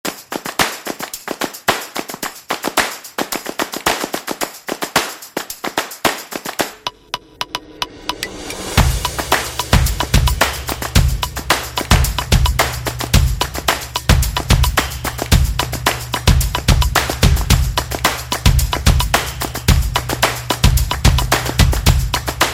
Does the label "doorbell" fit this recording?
No